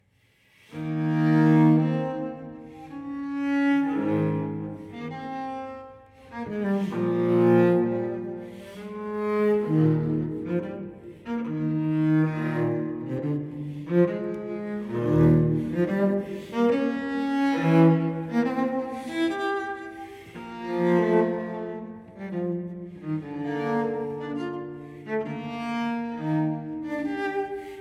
Cello Suite No. 4 in E flat major Sarabande; BWV1010; Johann Sebastian Bach

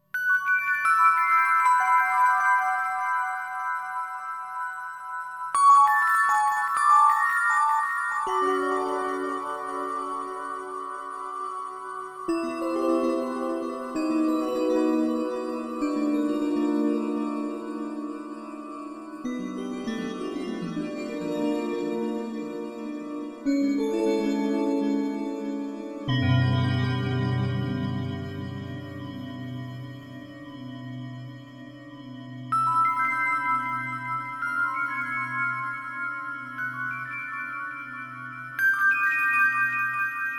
Bell Threshold; Sutton

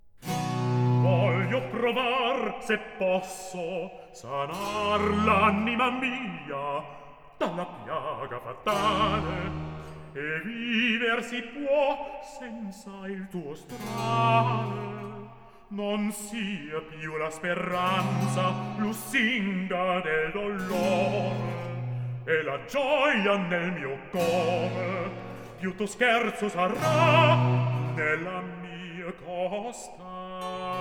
Recitative; Bach; Ton Koopman, Amsterdam Baroque Orchestra & Choir; Solo Cantatas for Bass; Amore Traditore, BWV 203; Voglio Provar

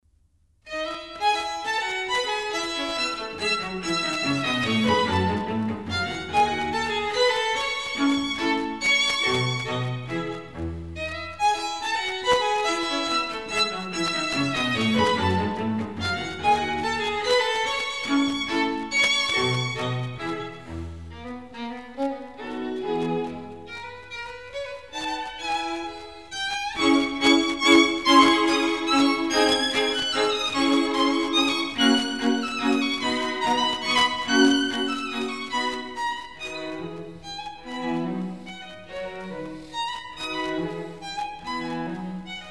Quartet in E, String Quartet; Op. 54 No. 3; Haydn

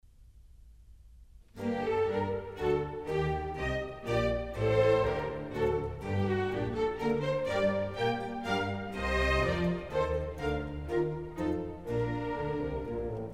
Water Music Suite

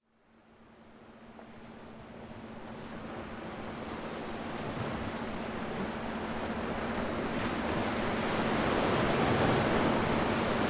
Waves, Binaural; SleepPhones; Newport

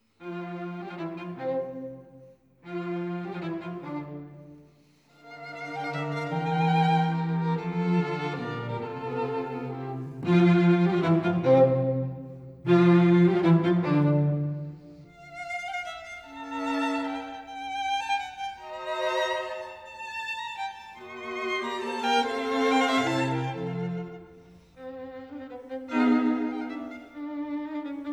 String Quartet in F Major Allegro con brio; String Quartet No. 1; Op. 18 No. 1; Ludwig van Beethoven; Beethoven - String Quartets Nos. 1-16

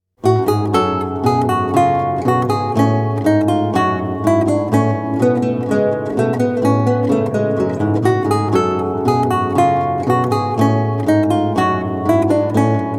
Lute Suite in E Minor, BWV 996 - V. Bourree; Bach, J S; Complete Music for Lute

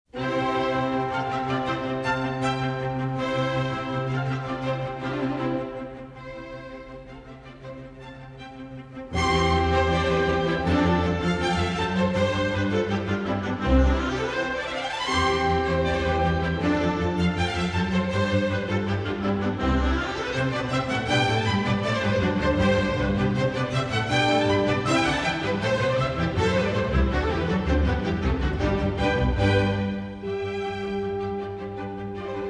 Cello Concerto in C; Jacqueline Du Pré; Haydn

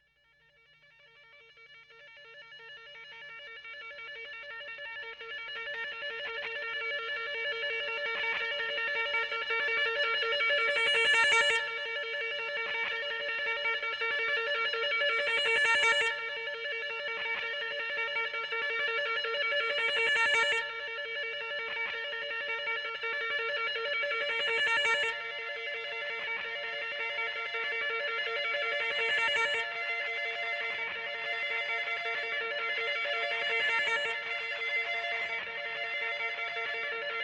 Loop III; Washington Square Church NY

